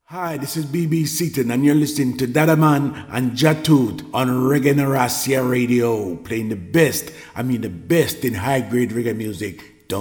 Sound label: JINGLE